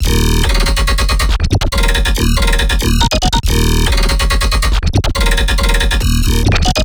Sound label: Melodic Bass Loop D# 140, Color Bass; Loops; Cubistic; Ghosthack - Color Bass Essentials